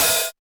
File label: tamed open high hat sound e key, Royality free open hi hat one shot tuned to the E note